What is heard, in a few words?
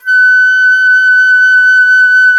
FLT, WOODWINDS; Roland - LA Composer vol. 1